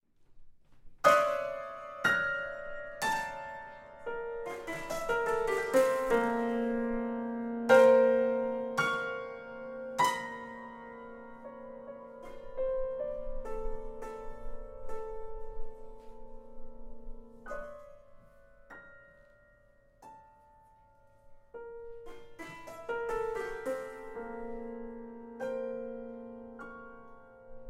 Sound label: Suite for Piano: Interlude